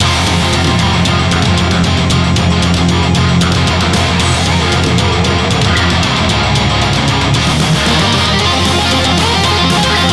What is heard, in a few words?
Bgm Reach